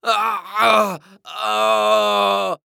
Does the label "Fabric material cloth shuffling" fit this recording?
No